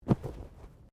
Body movement Tumble Light

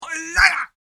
Karate Scream; Screaming Sound Effects; human

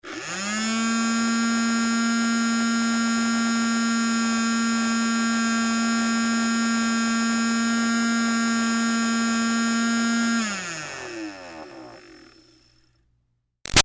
Toy Bubble Machine Long Steady; Miscellaneous Toy

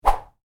Woosh, Whoosh: Short, Light; Sound Design; Whooshes; Stuart Duffield

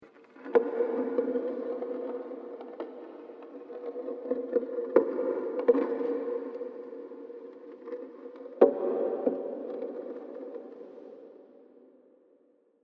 Catacomb Creepy Knocking Movement; Scary Soundscape Sound Effects; Horror Sound Effect

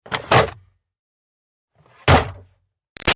Car Highlander Glove Box O-C Rough; 2005 Toyota Highlander V6; Open - Close; Cars; Vehicles; Big Room Sound

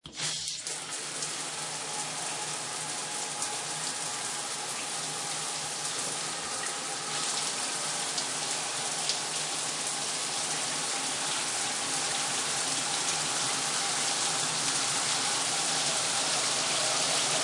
Shower On Run Off; Bathroom (Sound Effects:Household)

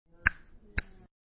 Two Snaps, Two finger snaps.

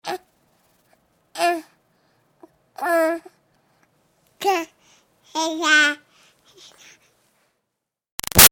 Baby 6 Month Old Grunts Happy Talk; Babies; Voice; Baby Sound Effects

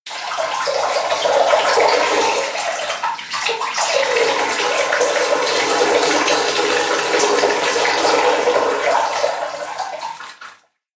Bathroom Urinating Sounds; Household; Bathroom Sound Effects; Andrew Fleming

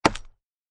Axe Chop Wood; Chopping

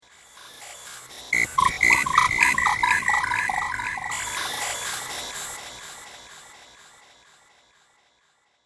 Random Access Droid, robot sound effects; Science fiction sound effect

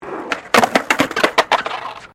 SKATEBOARD SKATE BOWL COME OFF; Skateboarding; Sports

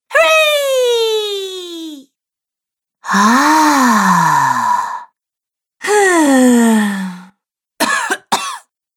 Female Vocalization Collection; Recorded Voice Sound Effects

